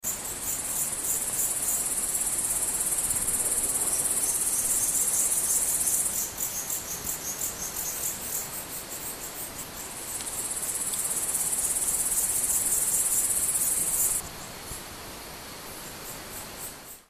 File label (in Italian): grilli in faggeta; Ascoltando il bosco